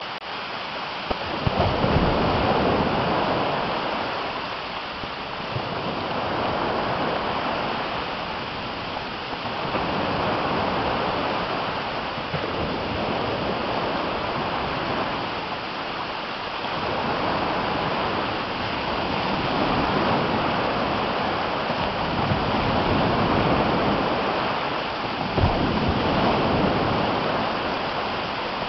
ambient hamlet rain sound

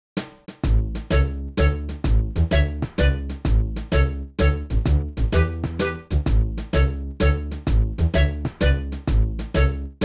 Course Clear; Picross 3D; NDS

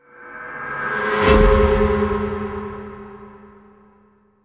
Special Item Collected

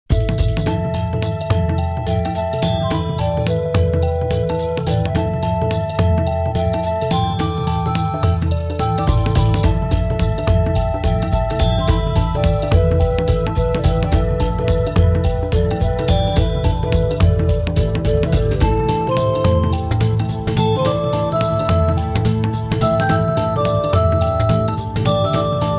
Sad Forest Drum; Klonoa of the Wind Door to Phantomile

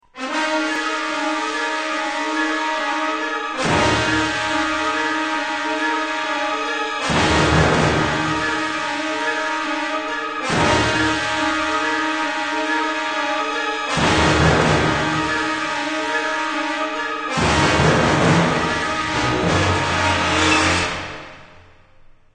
Music Dramatic

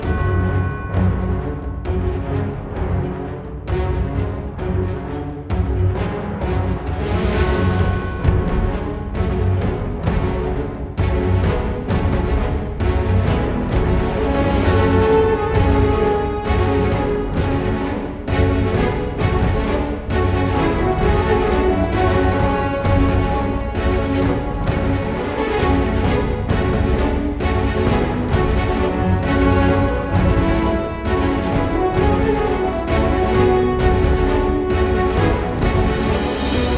Music Autumn Threat Med Loop

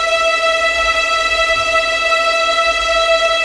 tremolo; ice age scrats nutty adventure; Level4 Hidden World

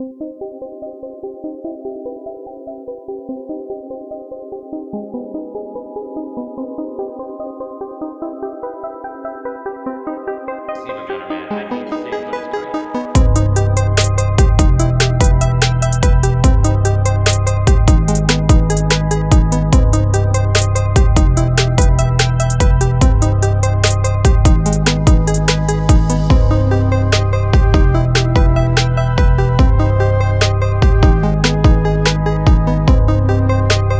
Trap Beat Instrumental; SGFR presents Shovelware from Beyond the Deep 2023

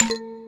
Coin; Plants vs. Zombies

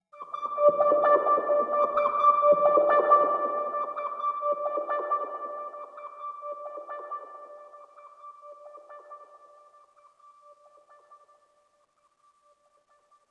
One shot Synth; zombie army 4 dead war ps4 stadia switch windows xbox one gamerip 2020

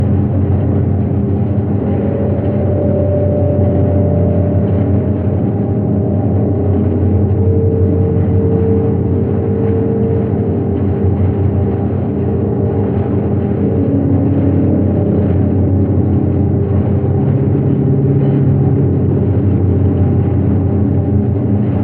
excavation engine

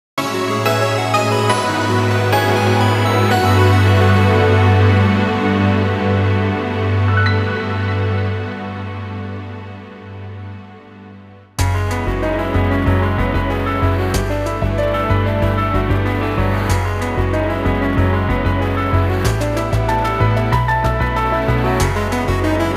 Defeat; one step from eden

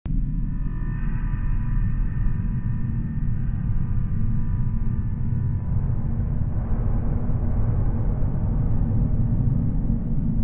Strange Winds (Middle Ambience); Five Nights at Wario's Deluxe

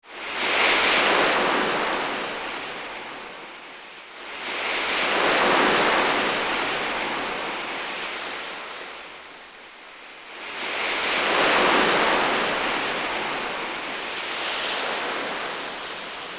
ambient seawave; bgm

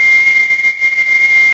brakes low

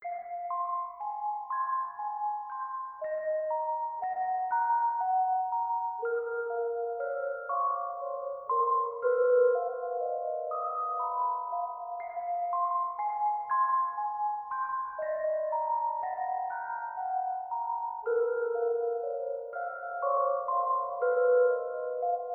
Doll Maker Music

